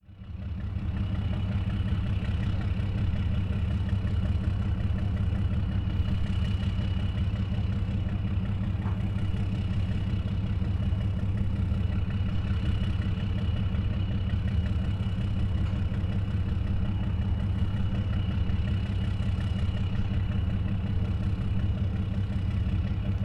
Motor Boat

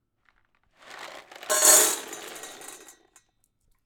Pouring Dog Food Into Bowl

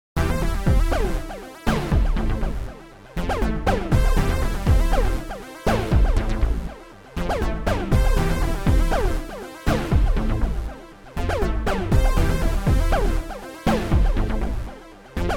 Chord Bassline

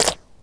Slime Attack

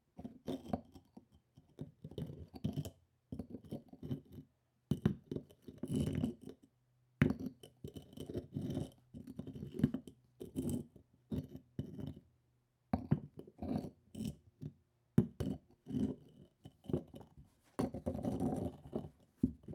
Rock On Rock On Wood Table

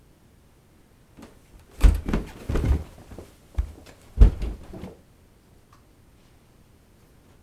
Run Downstairs

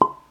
Pop

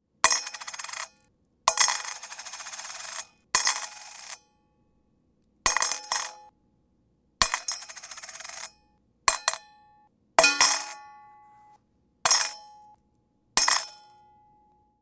Dropping Single Coins Into Metal Bowl

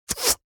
Bow Arrow

